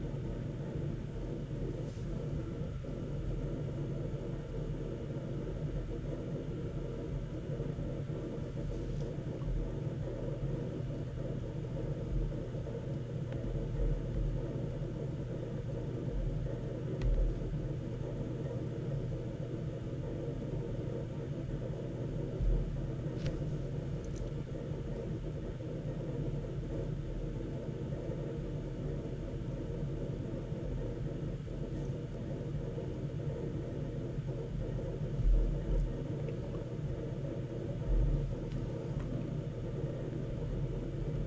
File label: Seagate Ironwolf Pro 20TB Hard Drive Noise; ST20000NT001; Synology